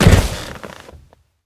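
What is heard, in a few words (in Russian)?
короткий громкий скрипучий выстрел ядра из пушки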